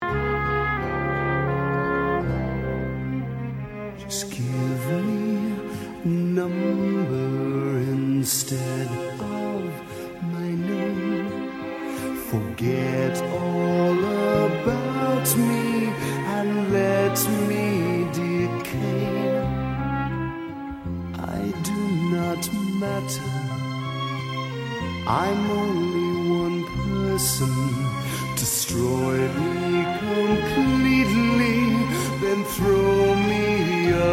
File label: Cast Recording